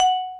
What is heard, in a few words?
Bell F#5; osu